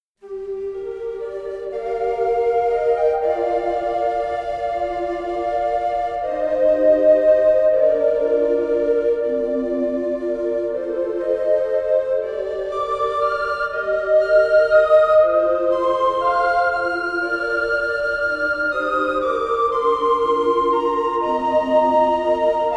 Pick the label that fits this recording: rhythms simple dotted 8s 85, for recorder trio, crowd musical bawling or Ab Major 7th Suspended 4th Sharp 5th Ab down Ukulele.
for recorder trio